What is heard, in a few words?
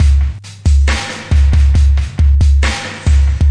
kik big verb, DRUM LOOPS and BREAKS, 068 bpm